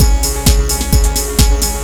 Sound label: drumloop; awe32